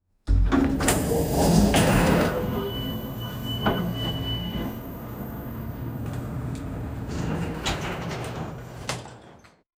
elevator door; open

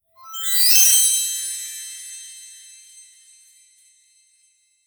lone light tear streak ge

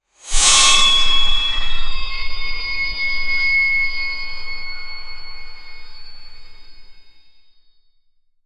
mystical sword slashes, celestial; 3 mystical sword slashes

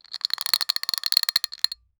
ultra realistic close up, clack

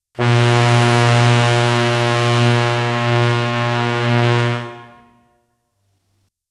airchime, truck; nathan airchime

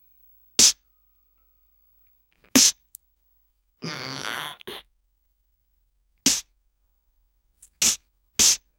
blade

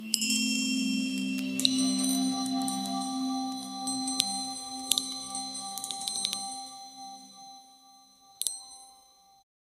a sensual divine typing; otherworldly

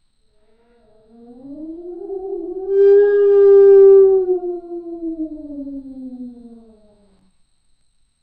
a complex interconnected, howl

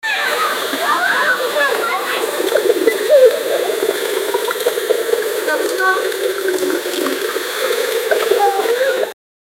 kids plying with water

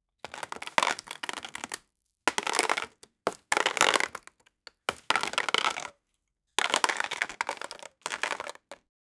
close up sound of roasted; studio

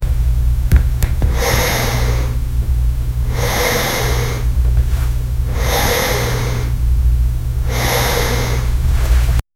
light breathing sound and, chair

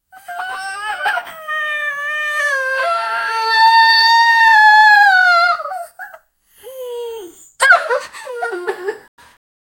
crying

